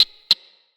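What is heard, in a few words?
Exterior Insect; Midrath Island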